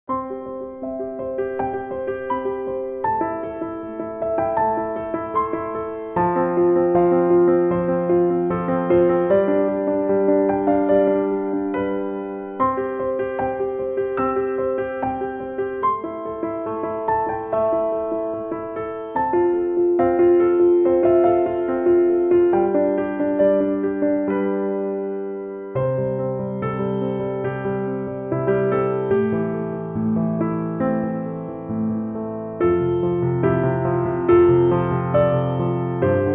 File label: Hope Piano